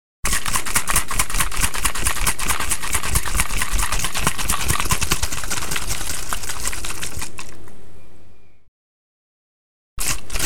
Am thanh khi lac nuoc chat long trong chai

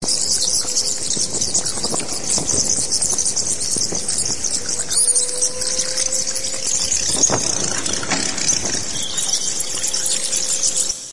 doi goi nhau trong hang

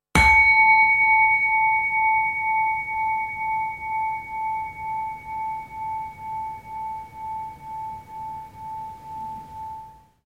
chuong 1 tieng keo dai vang vong

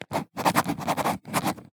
Tieng dung but bi ki giay to

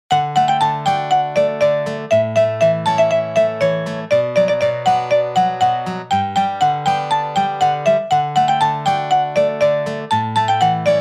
Nhac nen vui ve song dong de thuong

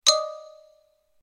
Am thanh nhay mat chop mat hoat hinh

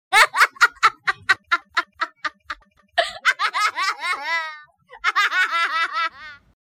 tiếng cười phụ nữ

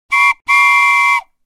Tiếng còi tàu hỏa hoạt hình

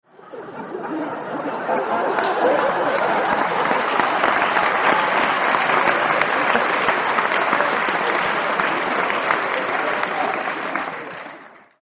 dam dong cuoi va vo tay

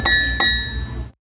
Tieng go 2 lan vao coc su chen thuy tinh

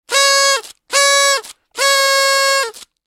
Tieng thoi ken party horn trong tiec sinh nhat

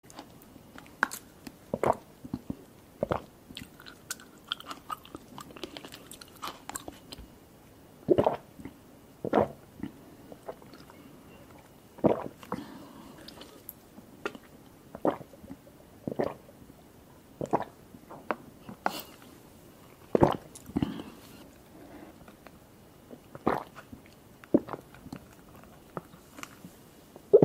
tieng uong tra sua va nhai thach

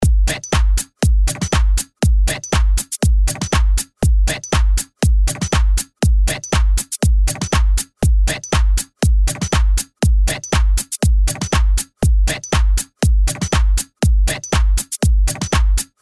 Nhac nen video giai dieu trong vui nhon lap lai nhieu lan

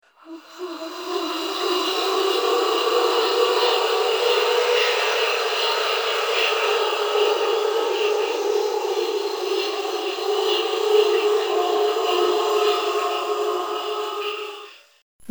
Tieng goi ma quai rung ron dang so

